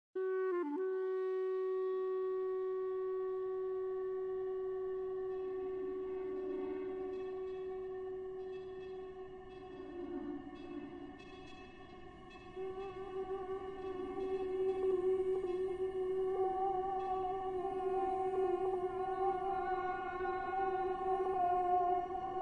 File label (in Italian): Concertino per clarinetto e live-electronics; in otto micromovimenti (2010); Maurilio Cacciatore